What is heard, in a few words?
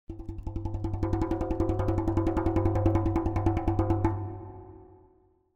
Drums Percussion Danger - Slower Drumming On A Thin Metal Percussion; Hollywood Edge; sound designer's toolkit v2